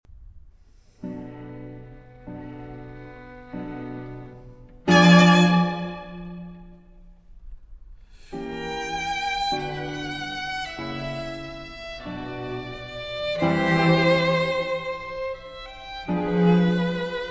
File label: Quartet in E Major, Adagio Cantabile, Sostenuto Con Passione; Quartet No. 7; MS 34